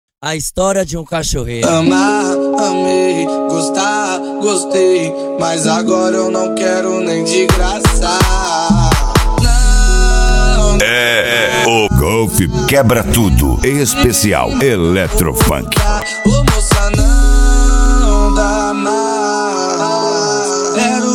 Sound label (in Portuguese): eletrofunk